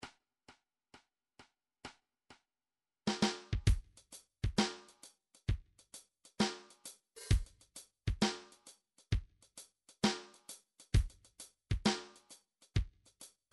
midi drum styles soft rock 132 half time triplet feel